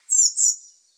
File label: Birds Various, Birds